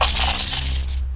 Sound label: attack